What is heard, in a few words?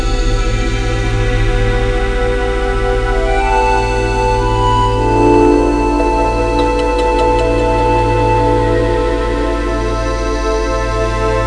MUSICA